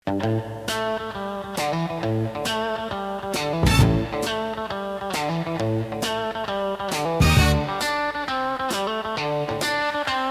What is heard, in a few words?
Six String Blues